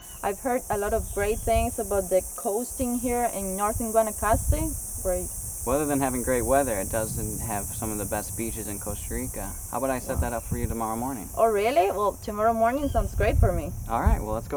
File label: cicada